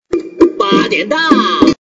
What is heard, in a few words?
sound water compare point